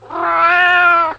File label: Cat Meow; WoWInterface; WeakAuras